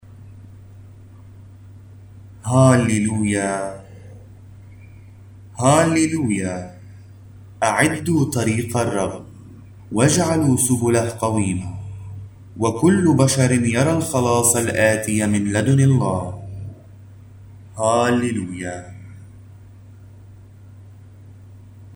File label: Gospel Antiphon; 2 Saturday of Advent